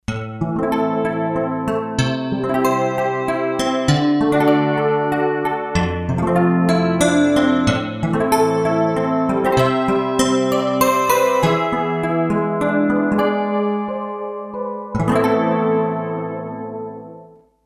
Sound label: Nylong